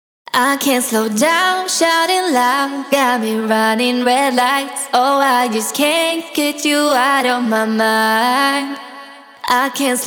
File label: vocal 105 AM